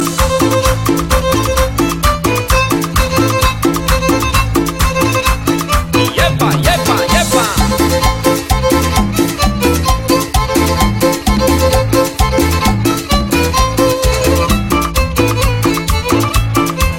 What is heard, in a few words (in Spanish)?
cumbia